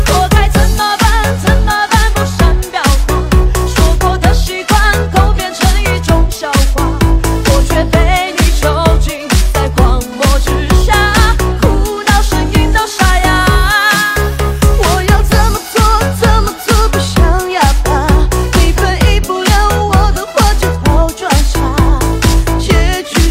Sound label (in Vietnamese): Nhac chuong remix; TikTok